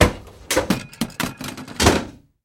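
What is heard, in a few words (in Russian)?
металлический грохот от починки машины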